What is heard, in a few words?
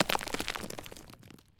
building collapse little